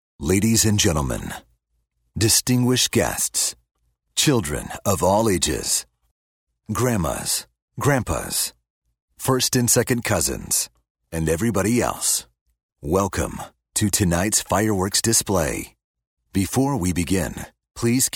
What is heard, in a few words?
fireworks